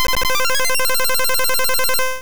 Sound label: Solar Beam; Pokemon; Gen 2; attack moves; GSC